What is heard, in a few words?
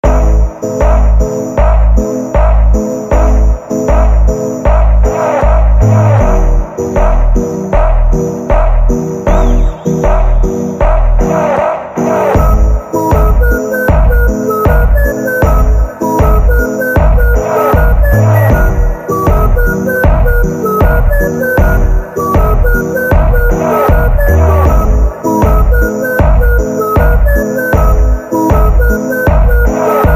Super Slowed Phonk